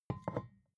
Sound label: vodka put down tipping